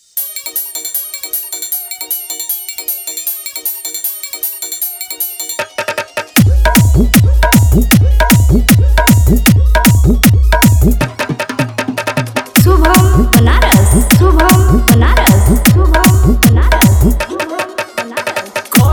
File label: Dj Song Hard Bass Mix